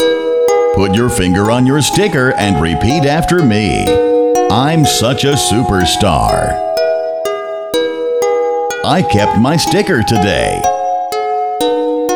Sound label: Sticker Chant